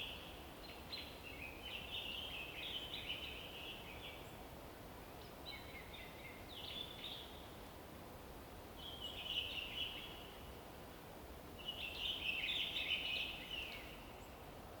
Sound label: AMB; DEMACIA